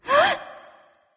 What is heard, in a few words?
nv breath; smite; awilix